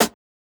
Snare Hit; Hip Hop Exotica; big fish audio

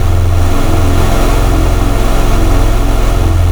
Good For Chords; Cymatics; Essentials Wavetables; Serum

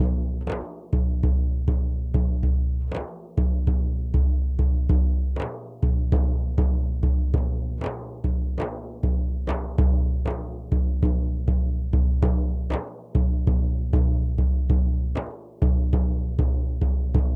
duff 098, Hip Hop India, 098bpm

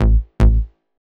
Am Bass; EDM 125 bpm; Drop; Ableton Projects; Big EDM 125 bpm (Drop) Project

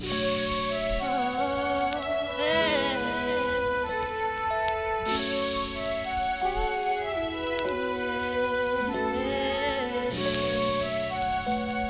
Full with vox, bpm95; hiphop; StudioLinkedVST Vinyl Touch; Progression 18

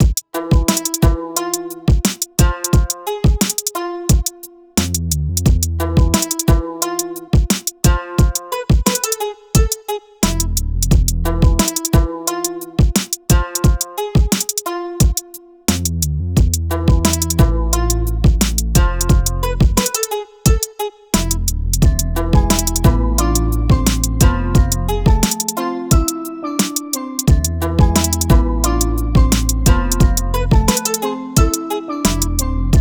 088 Emin, Hip Hop Exotica, Apple Loops; Big Fish Audio

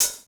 Open Hat Hit; 098 Cmin; Hip Hop Exotica; Big Fish Audio

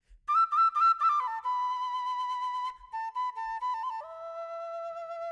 Flute B, Hip Hop Exotica, Apple Loops; Big Fish Audio

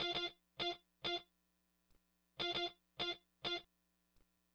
Guitar, Emin, Hip Hop

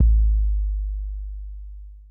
Kick Hit; big fish audio; Hip Hop Exotica; Cmin

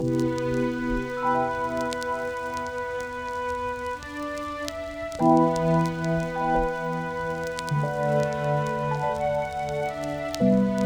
Full no vox, bpm92; StudioLinkedVST Vinyl Touch; Kontakt; hiphop; Progression 29

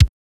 Kick Hit; 089 Bmin; Hip Hop Exotica; Big Fish Audio

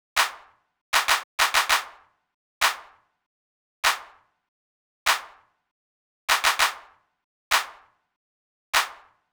Clap, Drum Tracks, Amin; Hip Hop; big fish audio; Hip Hop Exotica; Apple Loops

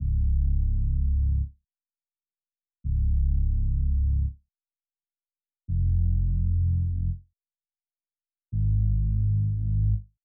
169 BPM F Min Reese Bass; Cymatics; Twilight; Destiny Gold Expansion

